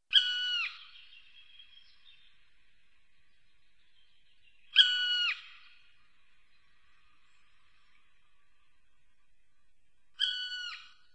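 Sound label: zhelna golos, black woodpecker voice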